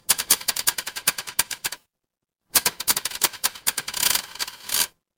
mechanical slot machine

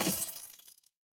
impact crash

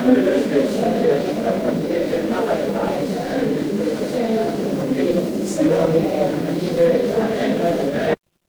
crowd murmur

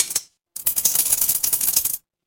impact coins

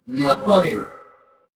feedback achievement

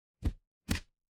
impact punch

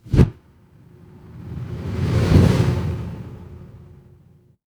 fire electric whoosh flame

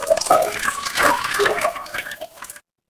water pour

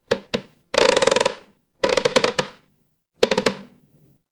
horror creak

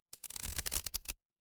glass crack

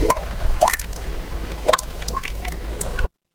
water drip

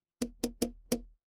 glass glass tap

